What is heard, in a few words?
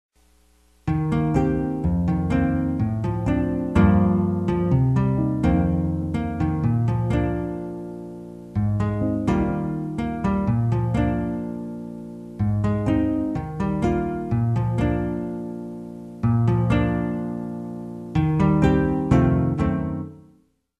guitar rhythm nylon